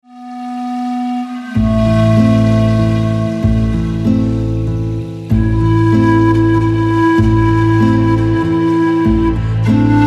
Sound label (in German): frei wie der wind, panfloete